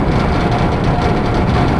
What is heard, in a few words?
fan rattle; movers objects